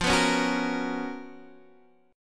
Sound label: Db 13th F, guitar